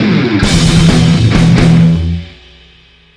Chuggy Short Riff

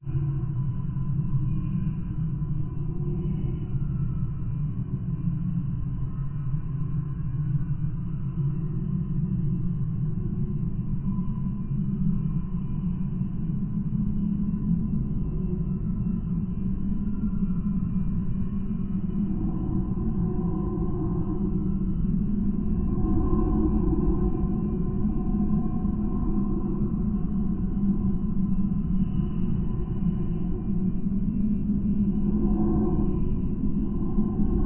Horror Ambience

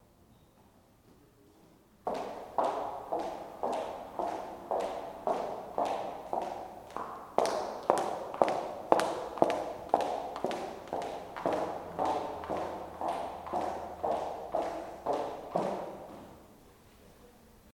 High heels slow

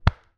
Punching

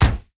Kick Drum Or Punch Car Door Slam

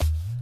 Bongo

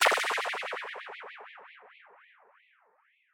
Magic Attack Small

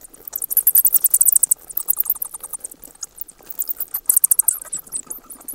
Bats

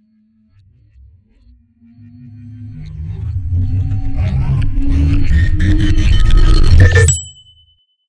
Sci Fi Disappearance

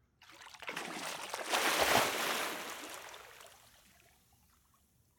Water Movement Body Dive In

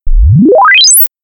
Log Rising Sweep Sine

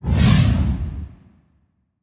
Whoosh Calm Fire Flame

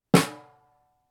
Snare Drum Single Hit

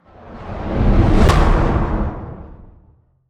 Riser Hit

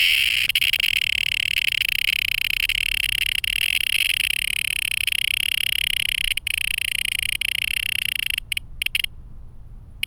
Distressed Cicada

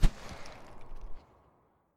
Rocky Dash

